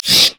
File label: wraith effort; LoL; monsters